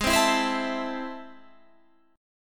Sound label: A Minor G sharp, Am/G# chord; strummed